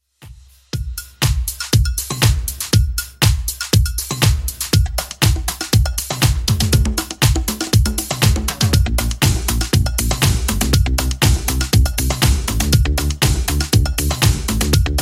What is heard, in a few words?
80s Redrum 120 bpm